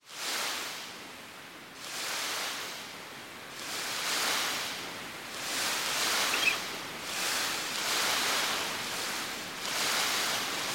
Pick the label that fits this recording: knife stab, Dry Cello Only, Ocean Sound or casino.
Ocean Sound